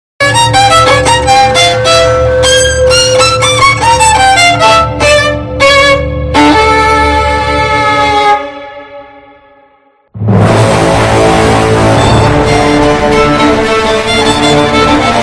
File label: violin loud